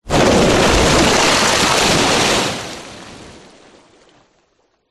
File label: explosion underwater above water pov